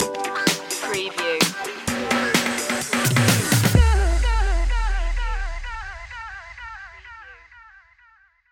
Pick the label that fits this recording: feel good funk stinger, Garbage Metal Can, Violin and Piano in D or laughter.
feel good funk stinger